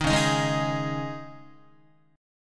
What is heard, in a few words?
Db Suspended 2nd Guitar 4A,4E,5A,5D,5G sharp,6C sharp; strummed; Dbsus2; JGuitar